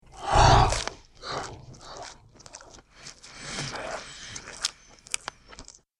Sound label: Starving Beast Eats, monster sound effect